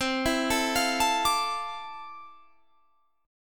F Major 13th C, guitar chord

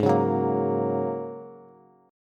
Ab 7th Suspended 2nd Suspended 4th, Bass; Ab7sus2sus4 strummed

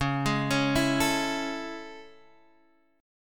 A Major 7th C sharp

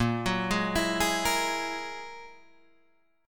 Ab 7th Suspended 2nd Sharp 5th, chord

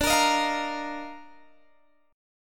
D sharp Major 7th Suspended 2nd D

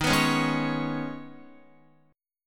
Eb 7th Suspended 4th Sharp 5th, Guitar; Eb7sus4#5; strummed; JGuitar

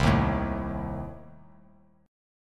C Minor 6th, chord; Cm6 strummed; JGuitar

